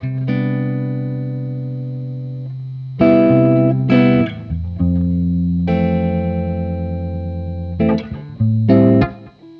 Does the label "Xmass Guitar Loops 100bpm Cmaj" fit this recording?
Yes